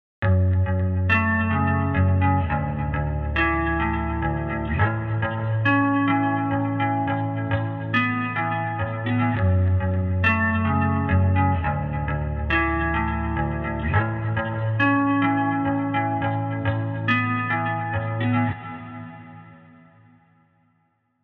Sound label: guitar after